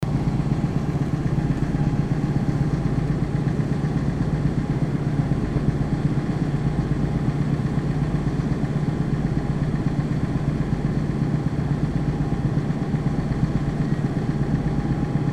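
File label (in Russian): звук производства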